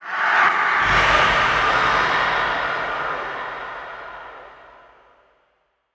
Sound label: cave, antlion distant scream; creatures